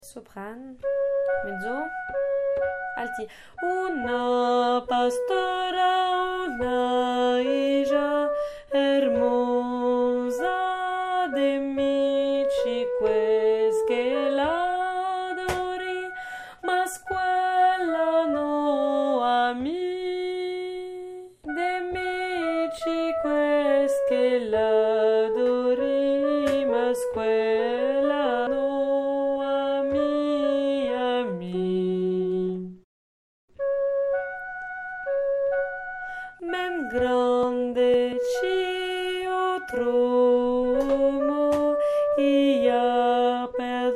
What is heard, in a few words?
alto; una pastora